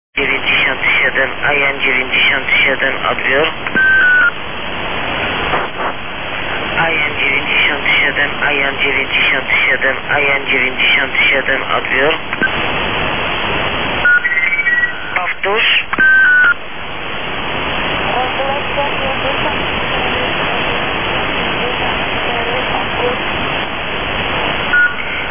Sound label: Polish military; 4786 kHz